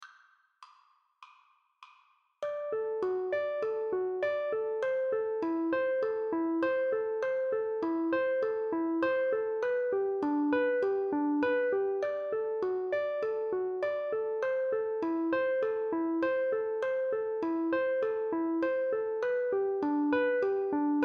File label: intro fast 100bpm